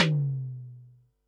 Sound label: tom, Pearl SC-40 Toms